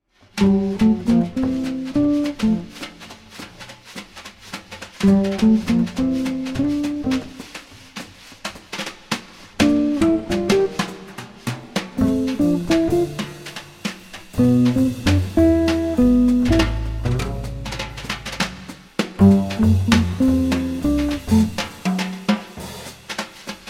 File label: Cute drums